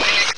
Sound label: Zoomin; Telescope